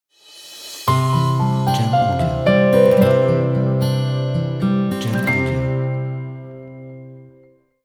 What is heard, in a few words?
Documentary Ident